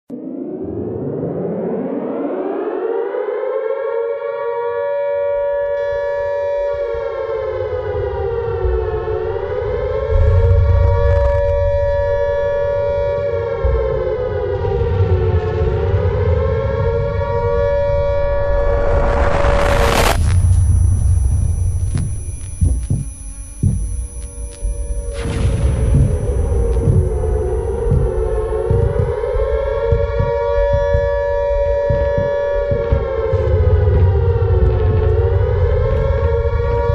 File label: shredding nuke; zps